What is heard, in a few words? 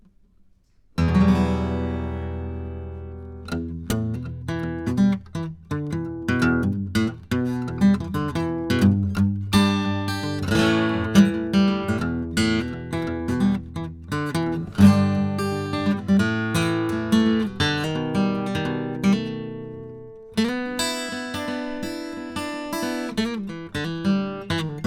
U87i Acoustic